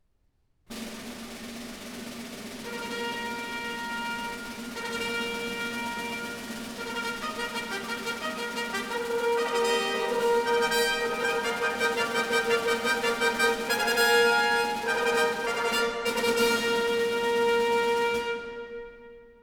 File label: Fanfare No. 1; Incidental Music to the Tragedy 'King Lear' by W. Shakespeare, Op. 58a; DG